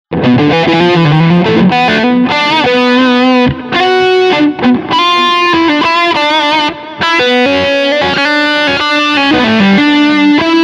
Echo Hall

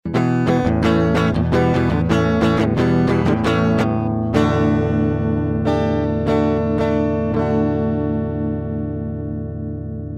Centrance MicPort Pro 2 Gitarre über Hi Z

